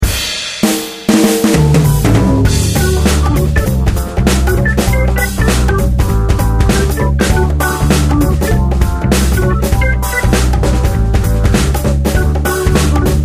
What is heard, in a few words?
Happy Organ; Snake Rewind